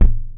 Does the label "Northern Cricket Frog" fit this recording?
No